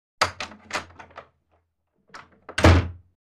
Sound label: Wooden door opens, closes